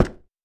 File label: Cement